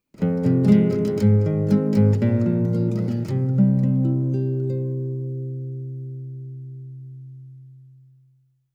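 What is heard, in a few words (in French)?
Music Jingle-Gratte-Court; Curse of Atlantis: Thorgal's Quest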